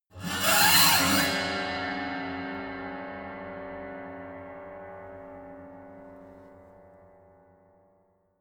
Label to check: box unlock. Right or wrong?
Wrong